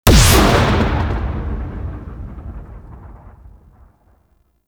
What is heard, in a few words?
Bubble Explosion; Enemies; Abilities; Warframe; Teralyst; Rainalyst